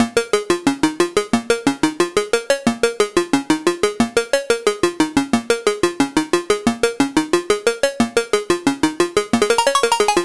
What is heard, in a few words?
Arp Loop - 180 BPM A# Min; Synths; Loops; Cymatics; EDM Starter Pack